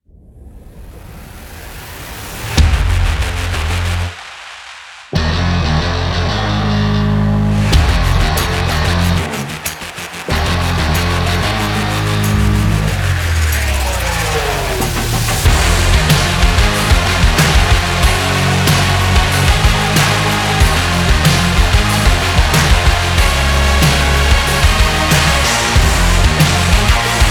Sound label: Drive Rock Guitar